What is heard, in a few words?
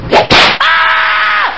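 whip crack and wild yell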